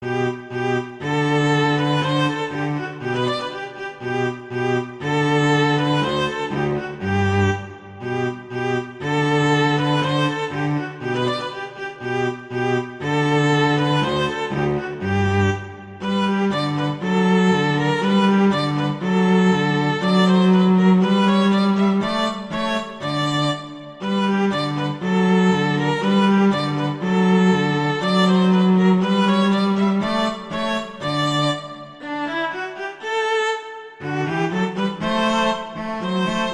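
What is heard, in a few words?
Duet, horn; Mozart; Duets; Vla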